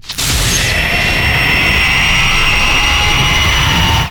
Banshee Mine Homing Start; Evolve; Kraken

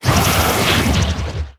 Pain Light; Evolve; Wraith

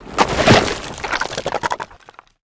Spider Trap Plant; Lurker; Evolve; Gorgon

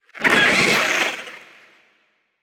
Ability Gooberball Fire; Evolve; Gorgon; Lurker

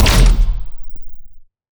strider minigun; Strider Remake

